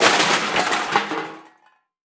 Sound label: Crash Metal Objects Hitting Cement; crashes; AdobeCC